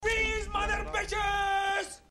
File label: freeze